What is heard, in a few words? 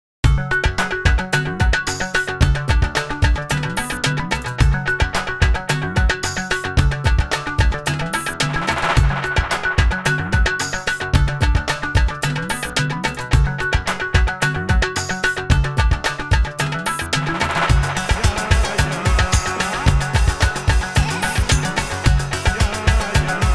instrumental; imaginasian radio; extended instrumental